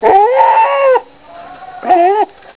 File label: buck, goats